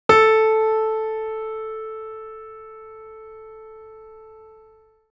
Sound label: Yamaha piano A5; dsp2008 sound library; YamahaH